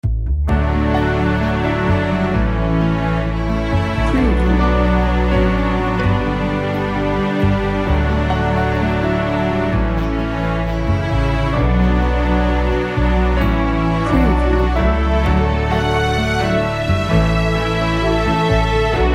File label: hopeful emotional cinematic